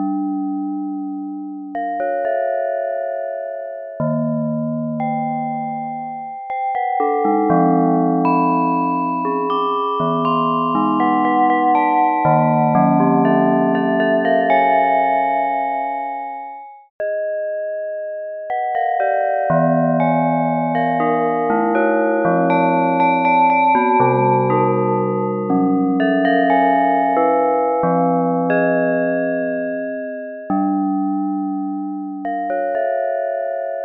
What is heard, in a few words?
git bell